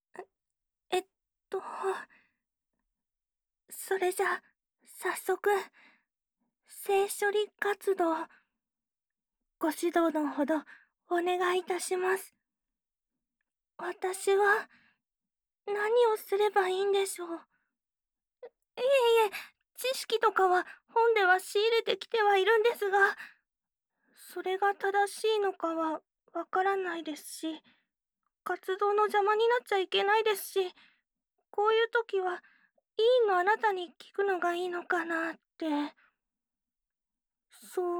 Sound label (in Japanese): キスをしてみましょう（キス・リップ音）